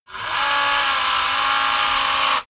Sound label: cordless drill low rpm; maschinen; bohrer; soundpool